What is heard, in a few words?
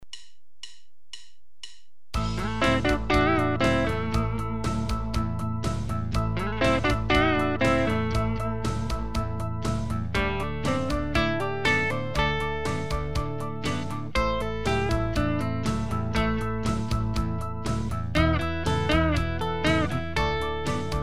Box I solo, guitar